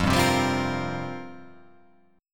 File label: F Suspended 2nd Suspended 4th F down